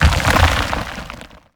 Leap Attack Impact Debris; Evolve; Goliath

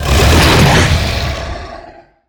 Sniff; Evolve; Behemoth

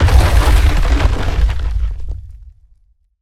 Rock Prison Formation; Evolve; Behemoth

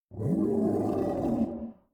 Pain Heavy distant; Grounder; Evolve; Kraken

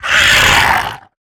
Web Sling; Lurker; Evolve; Gorgon

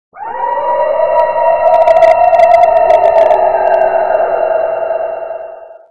spooky scream

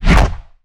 Whoosh Arm; Evolve; Behemoth

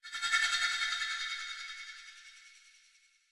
Explosion; Evolve; Gorgon; Lurker; Mimic

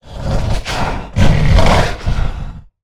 Exertion Light Breaths; Evolve; Goliath

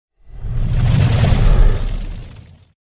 Rock Throw Impact FLAME; Evolve; Meteor Goliath

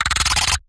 Exertion Light Breath; Evolve; Gorgon

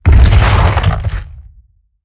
Roll Surface Start; Evolve; Behemoth

